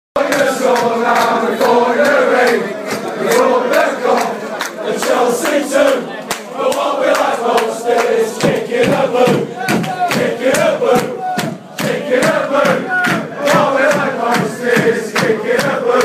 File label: chant